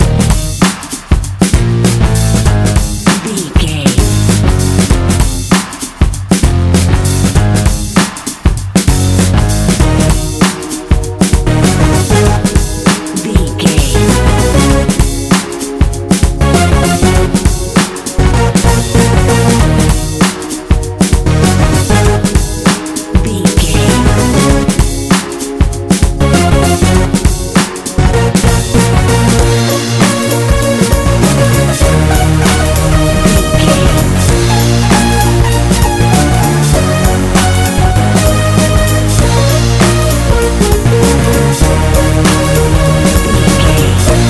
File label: upbeat edm hip hop catchy upbeat funky happy